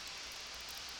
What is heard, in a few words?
Background Noise